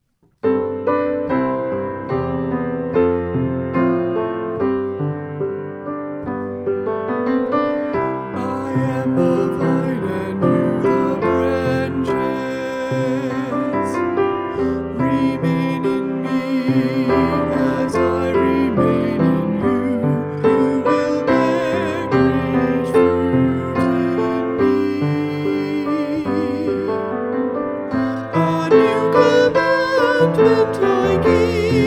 lower harmony, Lower Harmony (Alto); St. Anthony of Padua - Choir Sunday